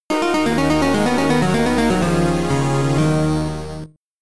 Level Failed; Troddlers; Amiga

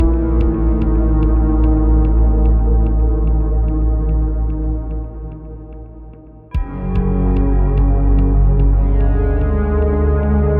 Suspense

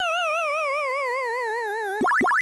Death Sound; Pac-Man Championship Edition; NES

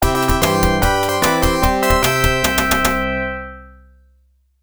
Winning Song

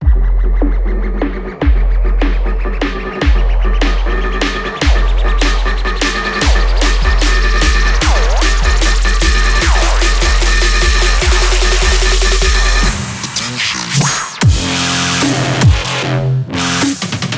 Electro; Farming Simulator 19

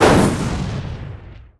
Boss Attack; Pac-Man Championship Edition 2 Plus

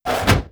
Panel Records Drawer Close; Among Us

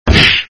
SFX sound (alien small punch); Red Baron

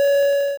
Warning

